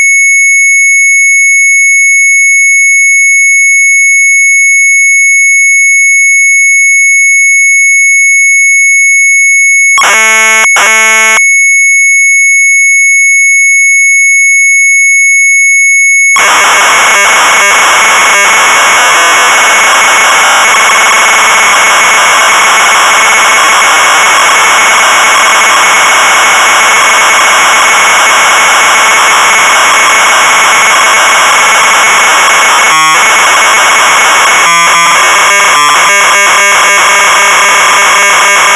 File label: MZ800 Tape; VLIEGPLAN; sharp